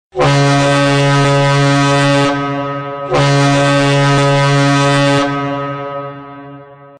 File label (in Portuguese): buzina de navio